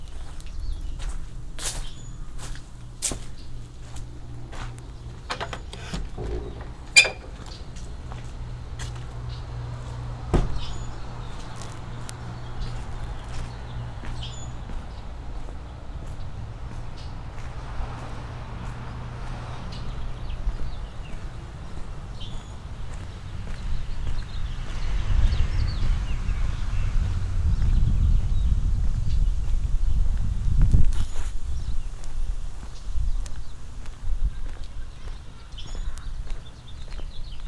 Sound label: Vernon Outdoors, binaural